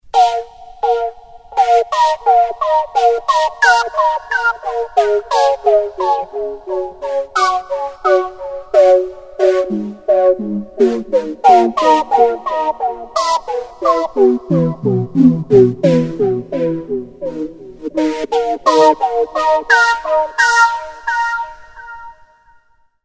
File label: Space Pad